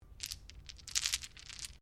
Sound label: Dice Small Shake Hands; Variety of dice sounds; Casinos